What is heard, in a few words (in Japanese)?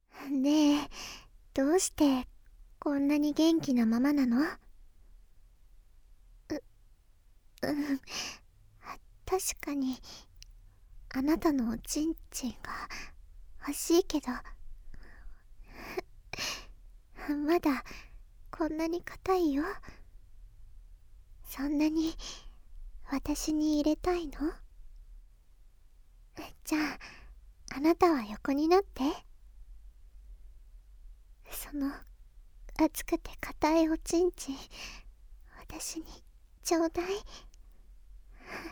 中出しエッチ(耳舐め・指で耳責め・中出し); 芯までとろける中出しエッチ